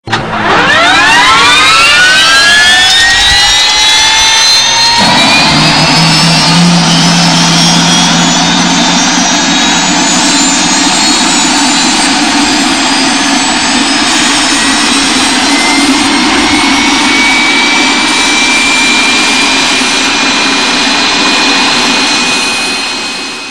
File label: Airplane Start